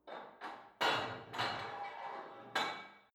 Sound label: put weight onto barbell unsteady reverby